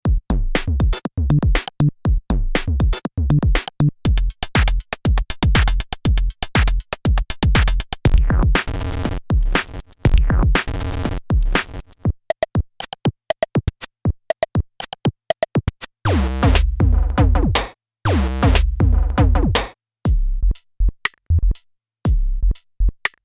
teenage engineering pocket operator 12 Rythm

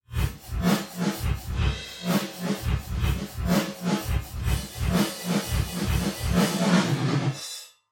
Reverse Reverb; انواع ریورب

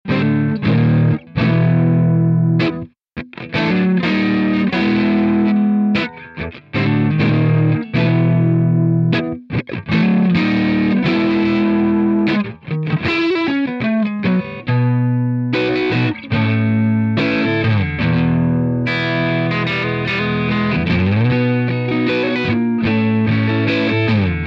Plexiglas 50W with 4x12 Green 25W; Hey Joseph ’68; Dyn 7

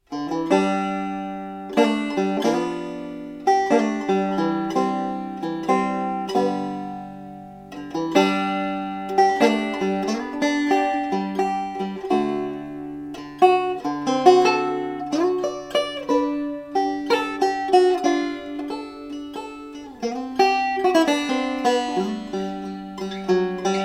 Golden Era Banjo; Deering

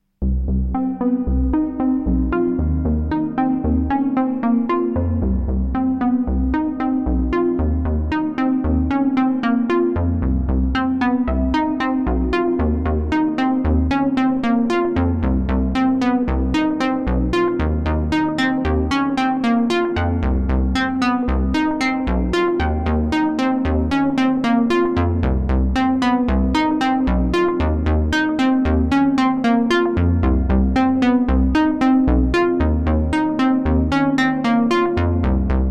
Grandmother Moog

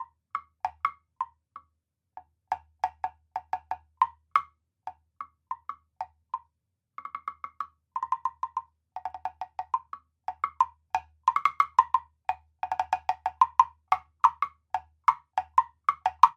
Woodblock; میکروفون استودیویی و حرفه ای اس ای الکترونیکس VR2

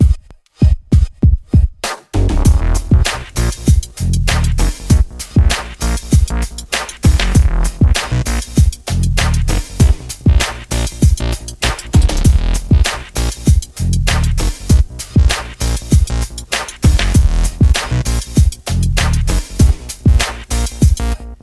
UNO Synth Hip Hop; ik multimedia uno synth; سینتی سایزر و سکوئنسر iK Multimedia Uno Synth

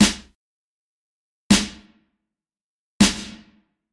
Pre Delay

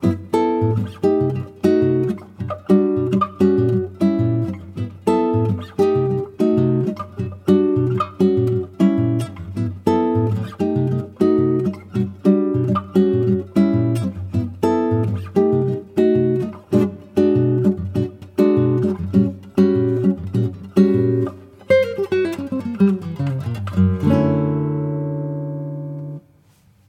Bossa Processed; se electronics vr1

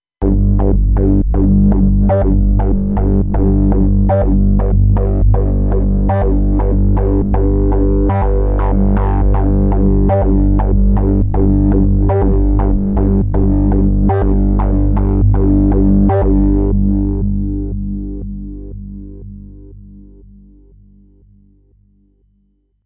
Synth; معرفی سینتی سایزر و سکوئنسر iK Multimedia Uno Synth; UNO Synth Example A

